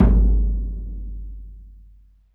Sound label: CEREMONY, LRG DRUMS; Spectrasonics; Heart of Africa